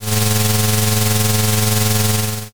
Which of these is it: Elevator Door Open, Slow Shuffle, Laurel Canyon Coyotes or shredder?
shredder